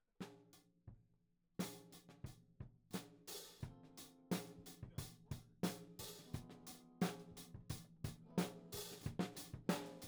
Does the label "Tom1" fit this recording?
Yes